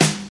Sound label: airy snare drum sound g key, steel snare drum sound tuned to the G note